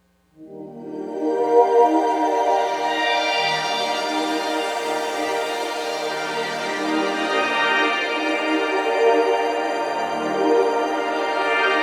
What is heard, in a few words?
Sparkle Major, pad; Sonic Foundry (Sony Creative Software); James Johnson Spektral Minimalism; Pad FX